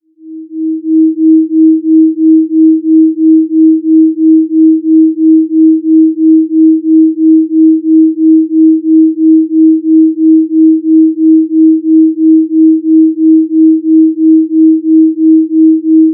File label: Binaural Beats Brain Waves, Binaural Beats Delta 320 Hz